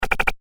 Four Rapid Beeps; Beep Sound Effects; electronic sound effect; Andrew Fleming

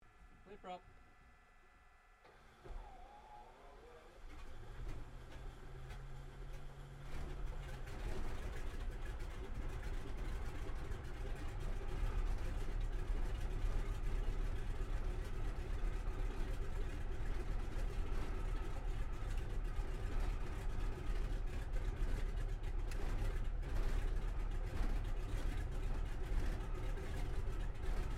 AIRCRAFT CA-28 CERES STARTUP INTERIOR; Airplane; Vehicles

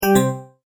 multimedia tone end; Tones; Sound Design

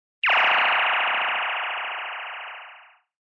Long Lazer; Lasers

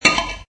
Metal Scrape; Metallic

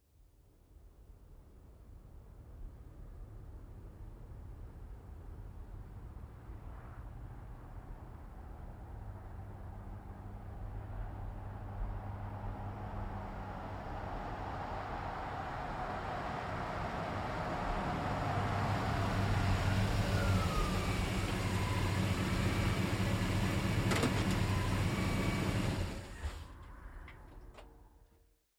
GMC Savana, Up, Stop, Slow, Truck; Vehicles

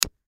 Candle Lighter, Candle Lighter Ignite; Lighters; Blastwave; Foley

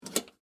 Lunch Box Latch, Open, Metal, Case; Foley; Latches

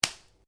Branches Dead Snap

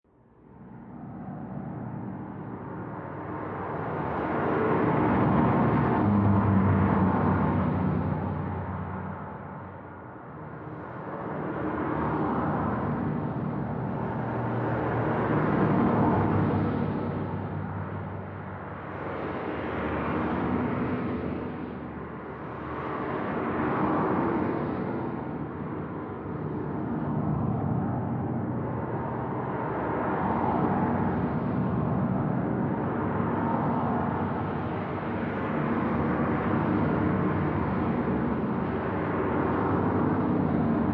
Distant Highway Traffic, Muffled traffic noise; heard from perspective inside a car parked away from main traffic

